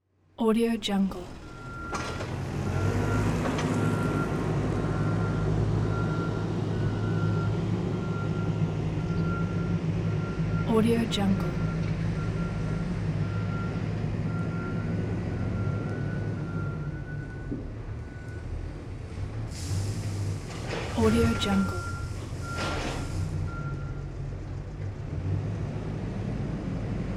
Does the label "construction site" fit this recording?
Yes